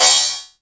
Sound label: crash, Korg Electribe ER-1